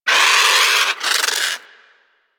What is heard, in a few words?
Avichaea Vocal Alerted; Warframe; Hunting Critters